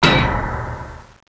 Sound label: ammo hits metal; PanzerE; Desert; CD-Gamer 1999-10